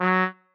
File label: marimba trombone; ゆめ2っき